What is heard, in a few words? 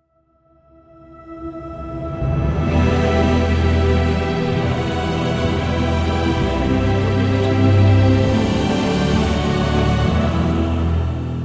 epic cinema ident pack